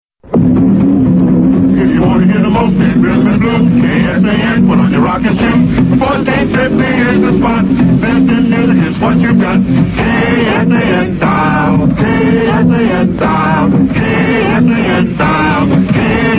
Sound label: radio jingle; KSAN 1450 AM; circa 1958